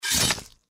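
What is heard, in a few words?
deathclaw claw attack; npc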